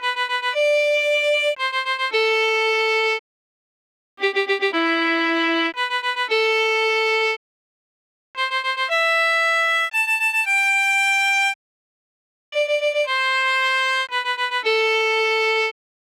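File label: Bundled Violin Shakes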